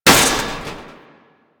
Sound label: Electronics Misc Destroy